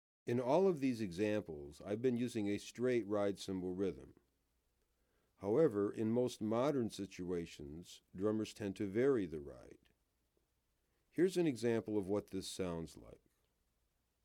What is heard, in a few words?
Modern Jazz Time